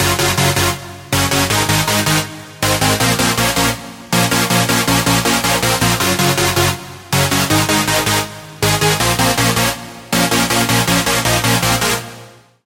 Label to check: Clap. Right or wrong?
Wrong